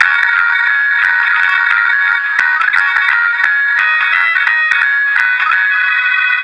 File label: rock